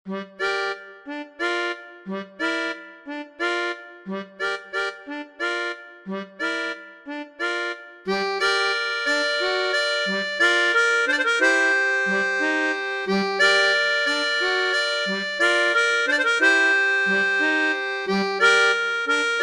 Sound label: melodica; Gayane act tune